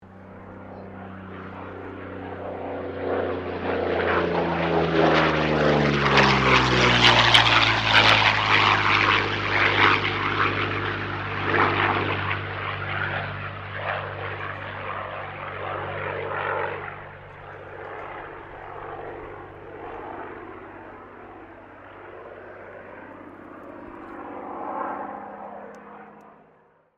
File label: AIRCRAFT P-40 KITTYHAWK PASS STEREO; P-40 Kittyhawk / Warhawk / Tomahawk; Airplanes; Vehicles